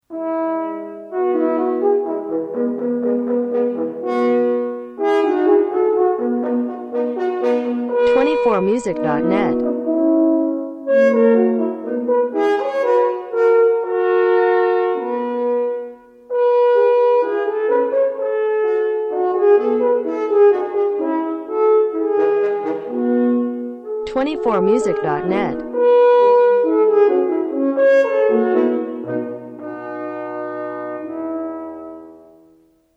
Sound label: duet for horns andante